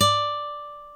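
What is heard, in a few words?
D4 classical guitar; InVision Interactive; Lightware VOL-1; Instruments & Percussions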